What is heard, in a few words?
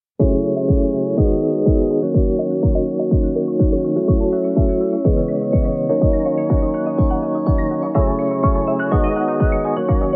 melodic organic house techno electronica; eversummer podcast